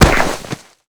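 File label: sandbag snow; Call of Duty Modern Warfare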